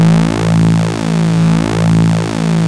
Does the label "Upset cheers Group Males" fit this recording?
No